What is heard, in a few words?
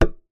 Key Noise, Melodica